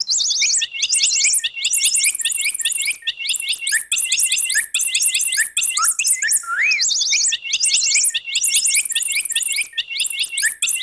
bird tweet

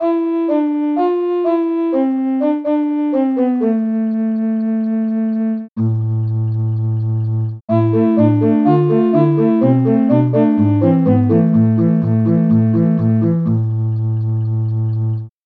recorder

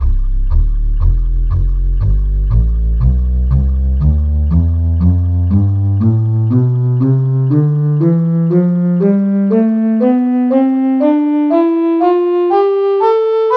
recorder; tonleiter

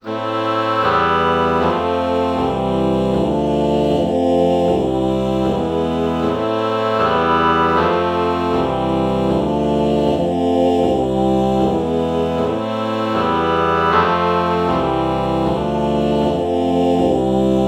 oh oboe chord morph

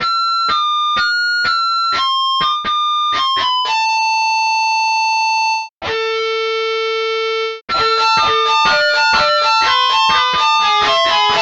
guitar harmonics

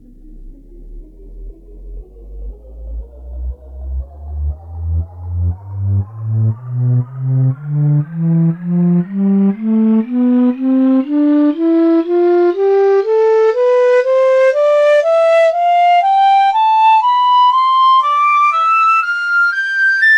piccolo; tonleiter

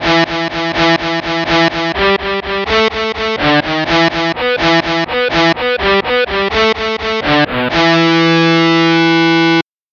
guitar harmonics bass

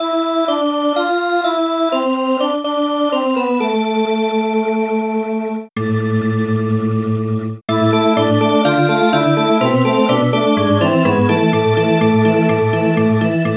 bowed glass

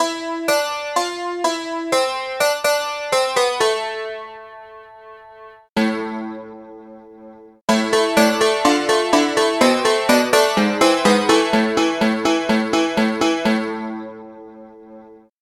dulcimer

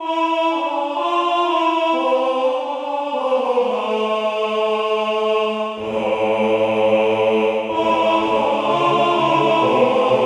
ahh choir

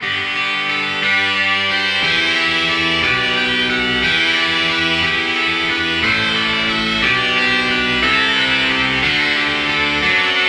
overdrive guitar bach

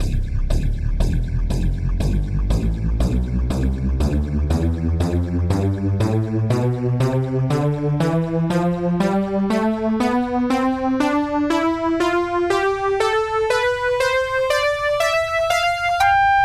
chiffer lead tonleiter

